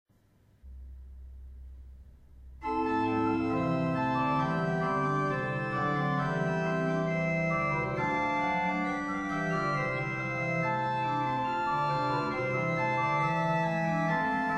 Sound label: pachelbel choral